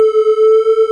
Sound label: clip flange